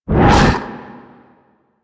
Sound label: Rupture Cast C; Warframe; Warframes